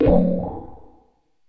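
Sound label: percussion, ambient, 160; eJay; Drum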